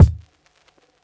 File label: kick; eddyflux; crate bd; dough-samples